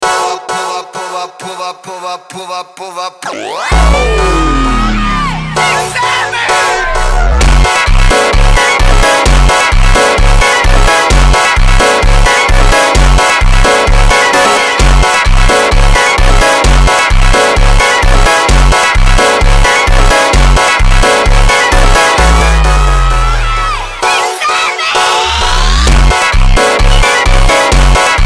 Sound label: FUNK; phonk